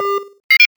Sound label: touch confirm